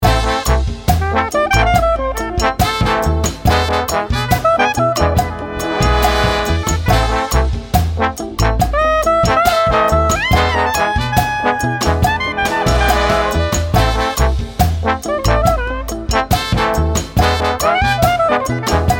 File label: chill latino